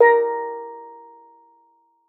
steeldrum A#3; Xsample VOL-4

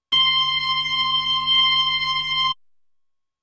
B3 Organ; Masterbits CLIMAX 8

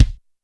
kick, Roland R-70, BD

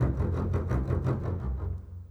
Bass, Orchestral; Sonic Foundry (Sony Creative Software); Orchestral VOL-2

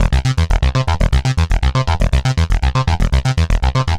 Arpeggio Bass, Prototechno

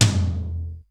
DOOOO TOM M, drum; Crypton - DrumBase5000

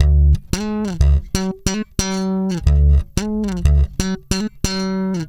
SFNK D#, Bass, FUNK; Spectrasonics; Bass Legends

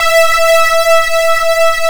M.BASS E5, M.SYNTH; AKAI S-Series CD-ROM Sound Library VOL-7

